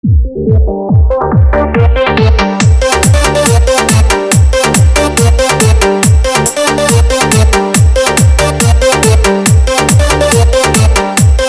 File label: Remix Mp3 Song